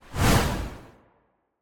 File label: Whoosh, Sound Design, Watery, Strong, Fast, Abrupt; Airborne Sound; Eclectic Whooshes 3